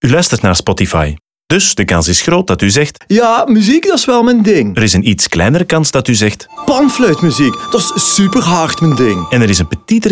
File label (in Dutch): panfluit